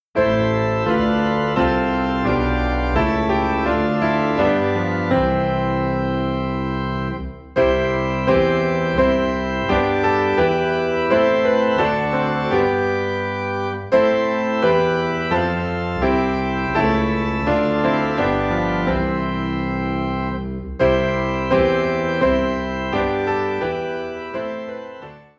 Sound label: Piano and Organ - High in C; A Mighty Fortress Is Our God